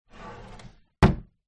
household window old wooden close queenslander australia; zapsplat